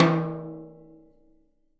Living Drums, JZ XTOM 1; Sampleheads; Peter Erskine Living Drums

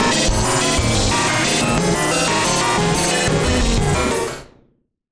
Malfunction Synth, malfunctioning synth samples

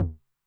Mid Tom Low Tune Short Decay, 909; classic machines; 909mod; MT - MID TOM

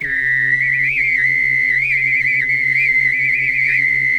WHISTLIZR, HUMAN SYN; ILIO; Vocal Planet VOL-3; Jazz & FX

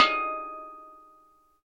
steel drum

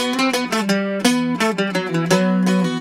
Celtic Flavours; USB Soundscan vol.42; AKAI

